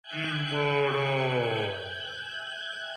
Notification Ringtone; Android and iPhone

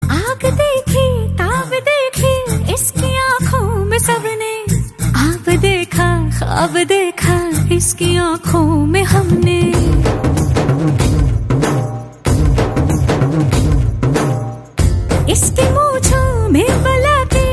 Hindi

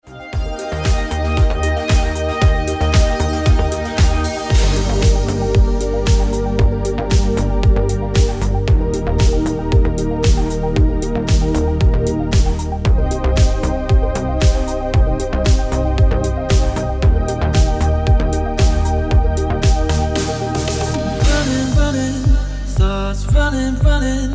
Ringtone

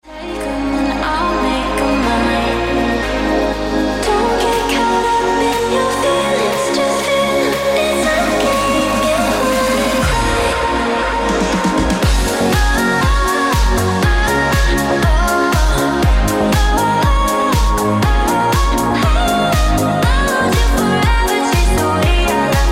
Original Mix Ringtone

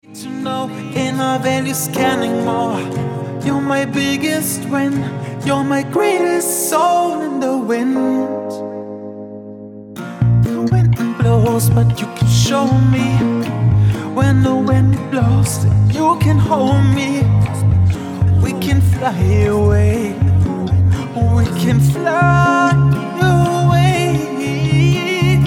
Original Mix Ringtone

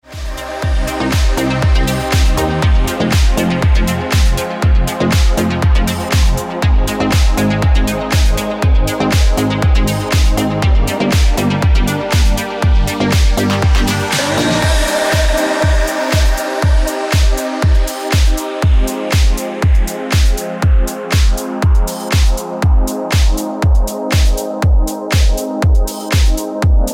Ringtone

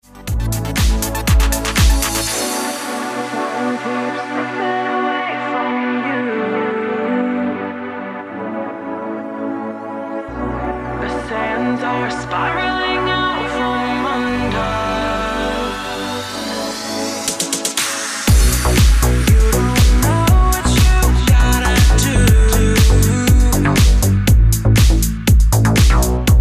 Ringtone

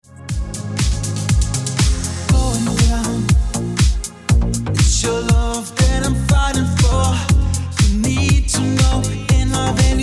Remix Ringtone

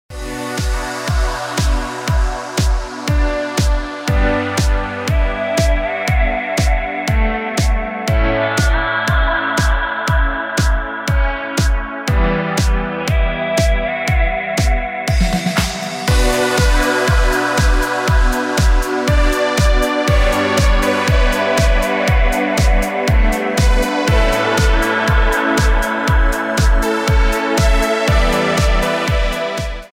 Ringtone

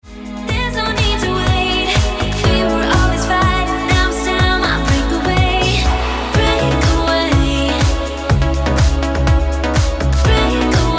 Ringtone

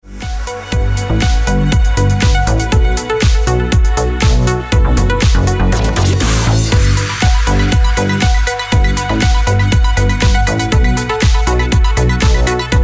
Original Mix Ringtone

